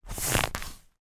toy inflatable figure squeeze air rush rubber squeak